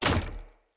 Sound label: Short Sword Medium Impact Wood Hollow Large; Weapon Impacts; Player; Swords; Environment